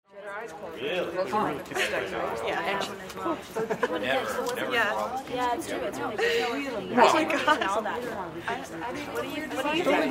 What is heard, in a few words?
Conversations, laughter, small crowds